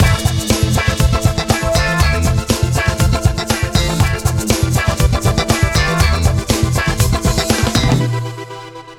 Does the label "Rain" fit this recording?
No